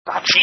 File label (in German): hatschie